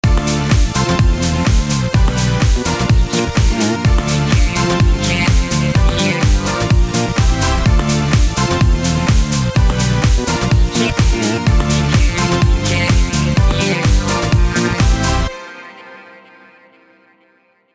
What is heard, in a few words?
Avenger Funky House; Avenger Funky House 2